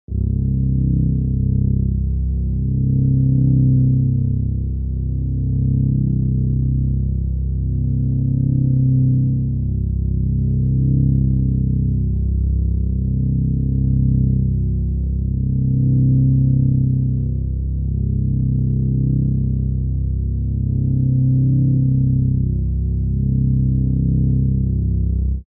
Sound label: Drone Low Synth Bass Pad Warm